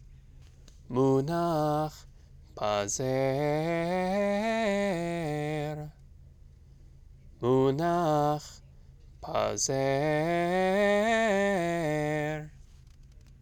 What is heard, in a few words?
pazer, trope; Trope Families